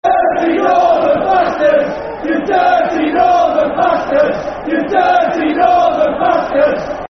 Dirty Northern Bastards (Cheltenham Fans), Football Chant; Cheltenham Town Ctfc